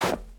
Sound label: jump; kenney fps; tactical shooter